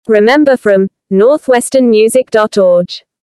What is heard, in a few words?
Jingle; logos